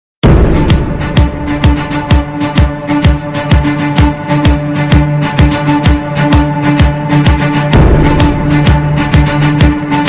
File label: Caribbean Instrumental